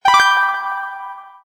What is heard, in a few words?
Get Flag